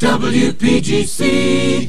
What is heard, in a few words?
jingles image pack remix fast voice track